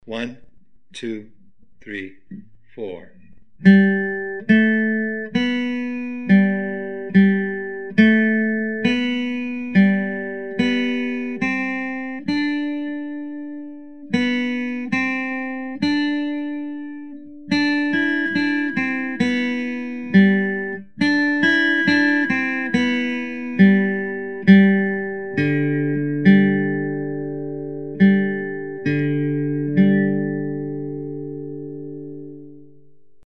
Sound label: Brother John